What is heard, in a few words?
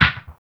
loop bit; Spectrasonics; Liquid Grooves; LOOP BITS